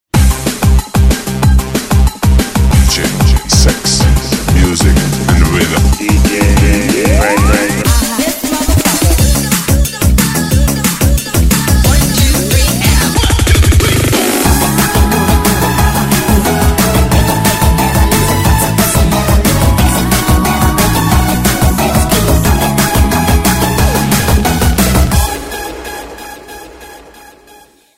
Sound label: Keep The Beat